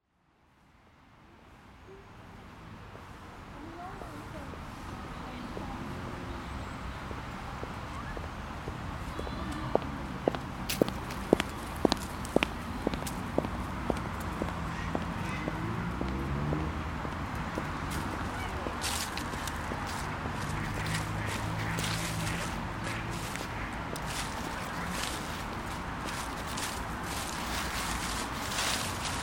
Stratford Park Soundscape

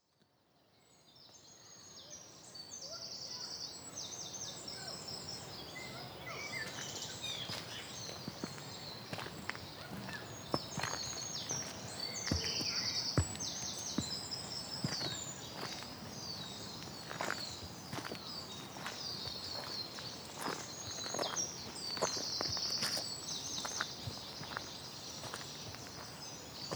Gnoll Park Soundscape

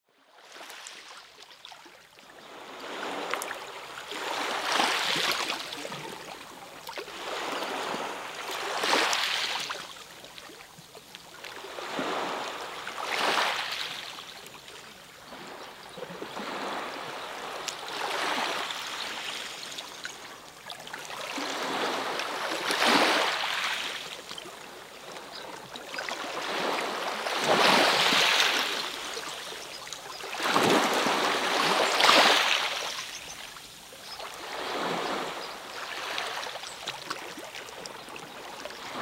Sea Soundscape

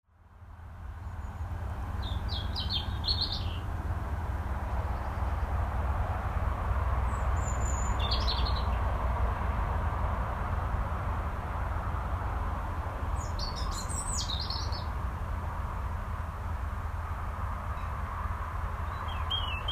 Woods; Stainton

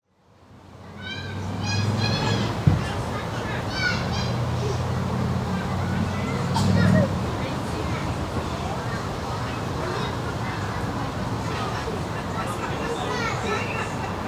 Another sneeze in the park

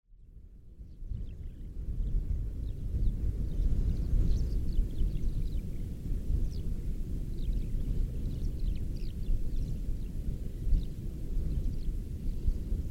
The Mawr Soundscape

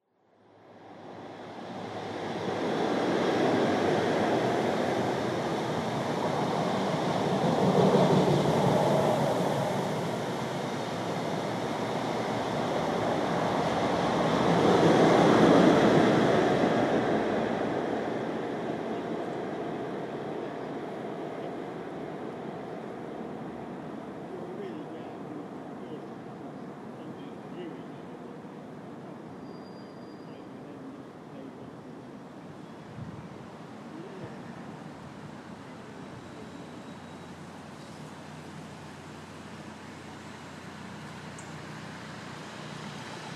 Bath Walk Soundscape

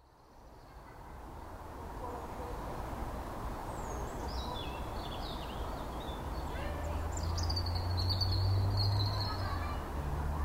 Morfa Walk Soundscape

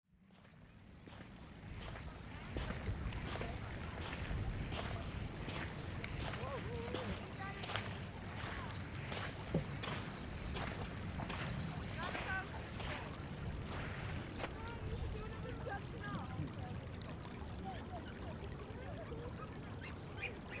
footsteps on sand and flowwing water

